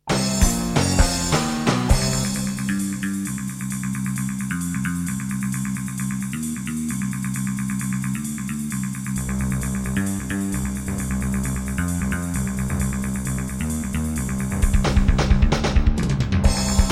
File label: EMU Sound Engine